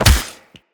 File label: Melee Fist Impact Robotic Flesh